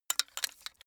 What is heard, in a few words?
metal pipe handling short